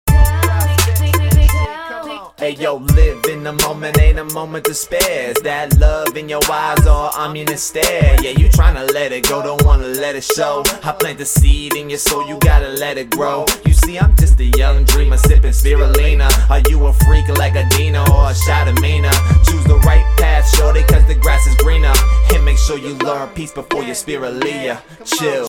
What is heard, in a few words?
Rap Sample; male rappers